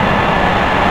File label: Nitro Hi, Vehicles